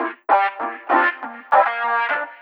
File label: Metafunk, Leads And Riffs